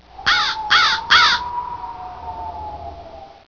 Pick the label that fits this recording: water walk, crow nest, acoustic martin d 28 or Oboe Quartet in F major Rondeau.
crow nest